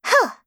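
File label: attack, Voice Line, Korean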